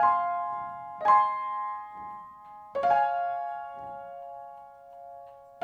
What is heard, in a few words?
Piano, 70s Analog Funk